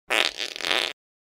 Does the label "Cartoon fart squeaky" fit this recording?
Yes